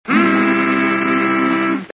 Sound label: Hmmmmmm; Jack Benny